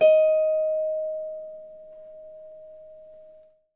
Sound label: Harp D#5; Miroslav Vitous - Percussion & Harp